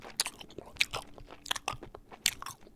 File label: Bubblegum Chew; SFX Kit; Foley - Misc